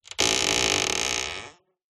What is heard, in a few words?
household door creak